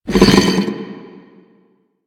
arm drag; Warframe; Man In The Wall

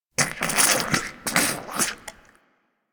Close Goop Release; Ambience; Warframe; Infested Eris

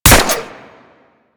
Harpoon Gun Shot 3D; Warframe; Sharkwing; Grineer

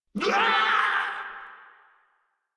Death, Grineer Male; Warframe

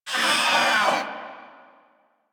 catbrow feral vocal death; Warframe

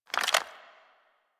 Reload, Weapons; Supra

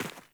Sandals Run Rock, Footsteps

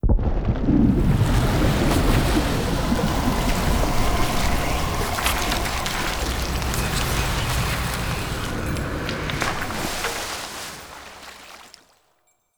Station Reveal; Level Events; Soulplane; Orlick

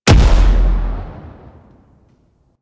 Bomber Bomb Explosion; Warframe; Eidolon; Grn Bomber

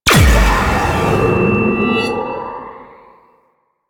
Heavy Slam Ability Charge Up; Warframe; Duviri; Dax

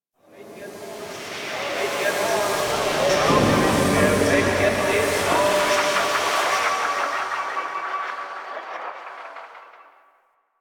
Ghost Cargo Echoes; Warframe; Quests; TheNewWar; Ambience; Zariman

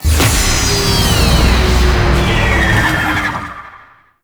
Prime Spawn; Tau; Twelve Minute War; Objectives Meta

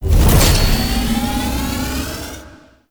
Evasive Warp Finish; Warframe; Quests; Wraith Quest

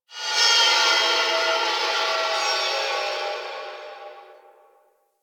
Exterior Organic Drones, Ambience; Warframe; Railjack

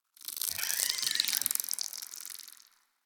Tree Critter; Ambience; Props; Warframe; The New War; Zariman; Quests

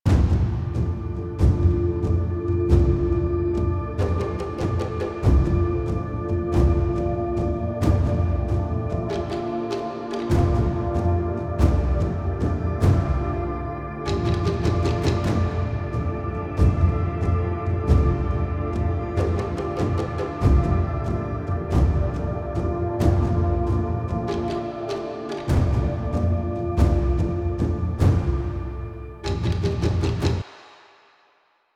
New Player Warframe Select Menu Music Loop; UI; New Player Intro

